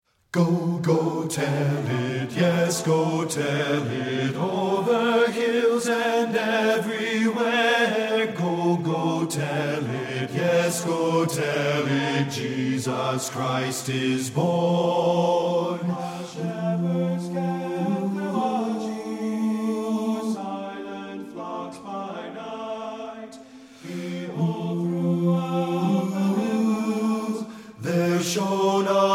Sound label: Go Tell It On the Mountain BASS, satb; partbypart